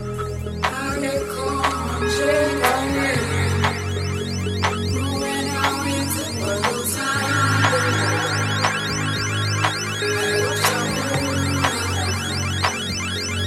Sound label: Kalimba